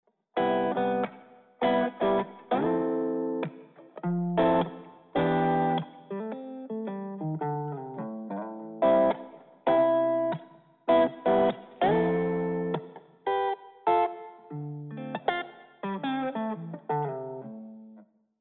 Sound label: jazzy box, guitar amp; cross the bridge guitar amp suite; marco dodin